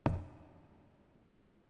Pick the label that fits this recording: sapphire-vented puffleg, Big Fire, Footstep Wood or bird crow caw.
Footstep Wood